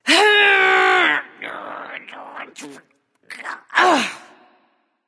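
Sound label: grunt lift, female; smite; voicepack; hera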